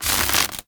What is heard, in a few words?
Tempest Tesla Cannon Impact Enemy; Weapon Impacts; Player